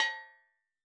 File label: Steel Drums Layer A5, Calypso Steel Drum; Percussion